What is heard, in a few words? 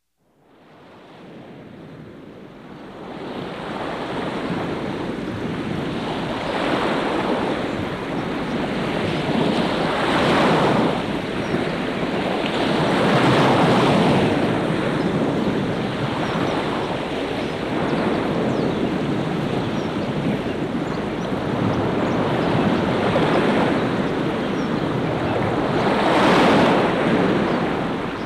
Ocean